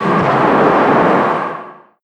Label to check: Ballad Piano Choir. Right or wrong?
Wrong